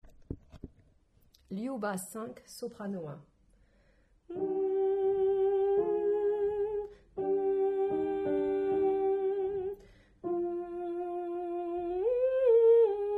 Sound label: Soprano